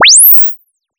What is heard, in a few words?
sine sweep linear; Tones